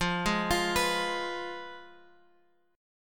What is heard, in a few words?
F Diminished Guitar Open G